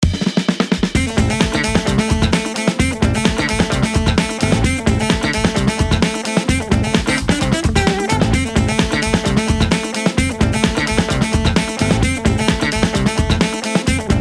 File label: Geinou Riff 130